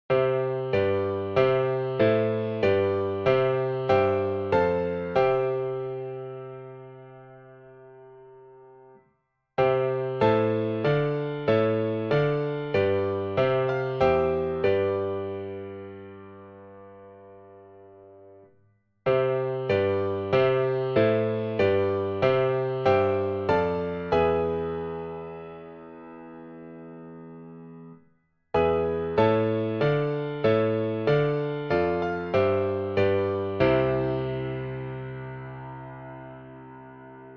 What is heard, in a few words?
piano; Symphony No. 3 extract; arranged for Piano or Organ